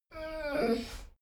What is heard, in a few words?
dogo argentino whine short; natur-tiere; hunde